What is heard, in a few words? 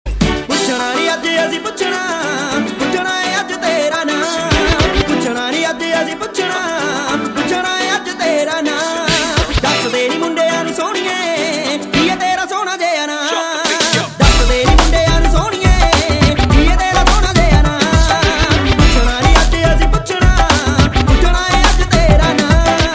Mobile tone